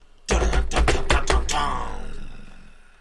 drumroll

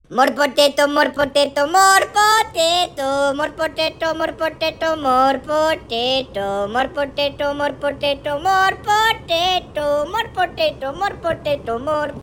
sing duck sing

